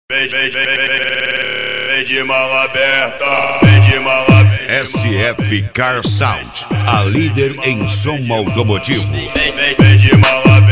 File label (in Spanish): reggaeton pancadao; sf car sound uruguay